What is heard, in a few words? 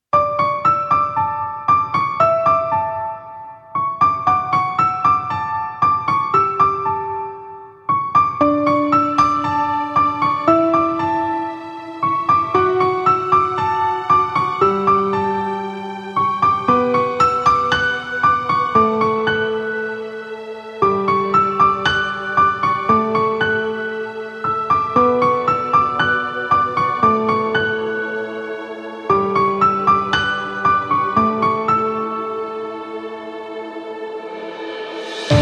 Pop Froeh